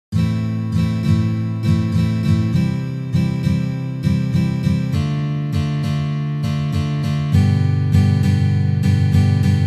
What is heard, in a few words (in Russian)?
Primer akkompanementa ritm